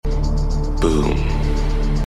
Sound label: boom